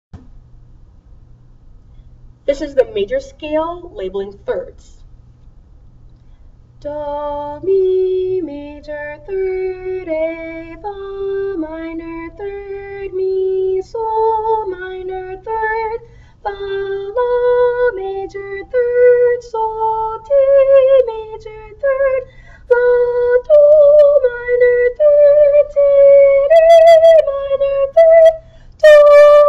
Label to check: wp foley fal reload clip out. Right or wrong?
Wrong